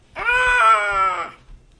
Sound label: weird bird call; Sound FX Real Life